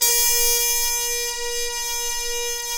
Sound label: BRS TPTHA, Harmon Tps S; Roland L-CDX-03 Disk 2; Harmon Sect